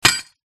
impact metal on dish ceramic peices in plastic bag; zapsplat